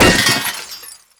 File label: robo impact soft; physics; Garry's Mod; tf2; mvm